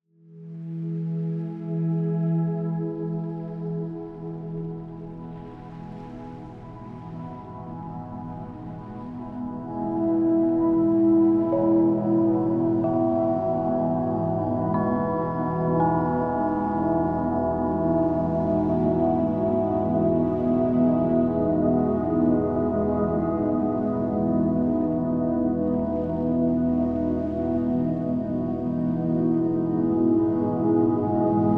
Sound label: Soothing Eternal Synth Pads Soft High Bells